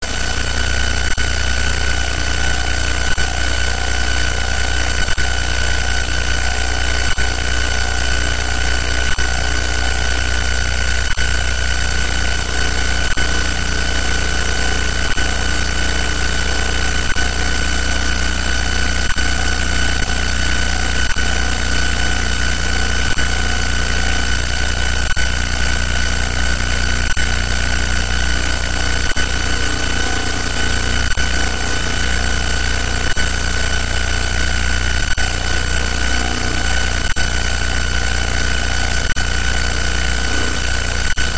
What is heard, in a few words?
vaio error